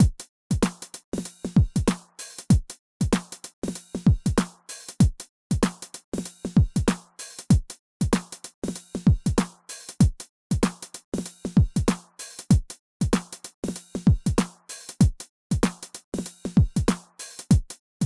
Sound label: RD-8